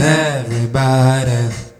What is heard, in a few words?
HEY VOX, Roots Disco; USB Soundscan vol.32; AKAI